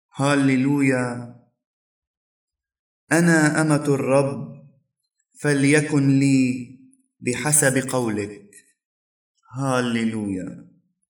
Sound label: Gospel Antiphon; 4 Sunday Advent